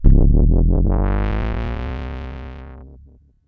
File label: Sci fi